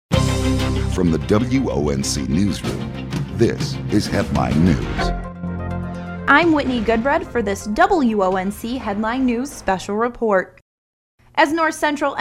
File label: Hammond